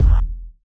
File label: bitdepth kick; nova sound